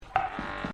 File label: turret startup; lethal company